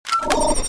button

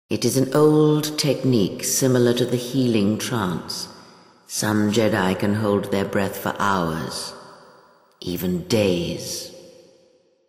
breath, voice; Star Wars Knights Of The Old Republic II; XBOX

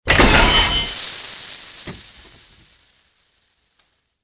train release; Soldier Of Fortune II Double Helix; XBOX

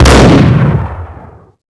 shoot pump shotgun; weapons; Max Payne

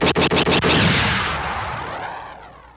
pistol Fire Secondary; weapons

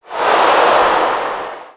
NEG Reaction,Crowd; Cars; XBOX

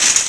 bouncy newsstand hit; bouncy objects; Tony Hawk's Project 8; XBOX

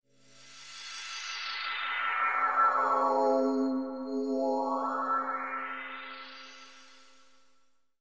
theme stinger; Myst III Exile

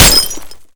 Sweetner Glass, impacts; Totaled; XBOX

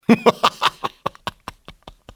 laugh; Soldier Of Fortune II Double Helix; XBOX

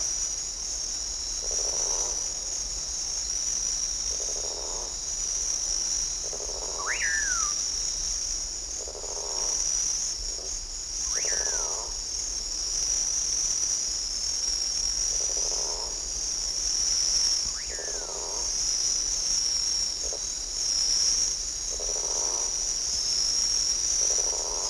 ambience; Street Hoops; XBOX

